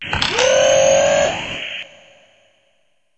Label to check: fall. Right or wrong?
Right